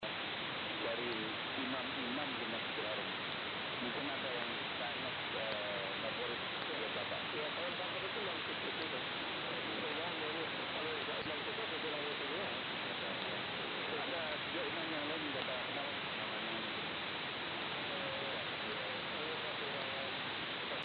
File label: Radio 21670khz; Radio Riad